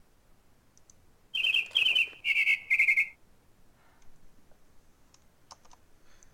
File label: Animal Call Bird